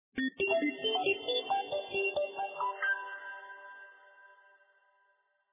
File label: Polyphonic, alarms; NIU; N109 Niutek